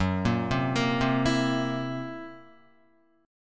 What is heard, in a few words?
F sharp 7th Flat 5th Guitar, F#7b5 chord; arpeggiated; JGuitar